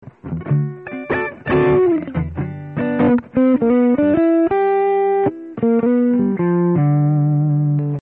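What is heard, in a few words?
gibson both dirty, Les Paul; Pickups beide, DIRTY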